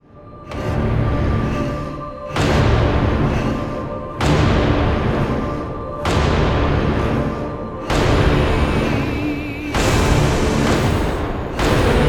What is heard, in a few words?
alert